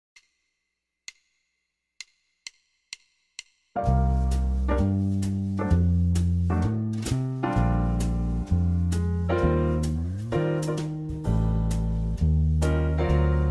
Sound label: backtrack Bb; jazz; myvirtualband